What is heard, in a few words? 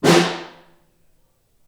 Snare Far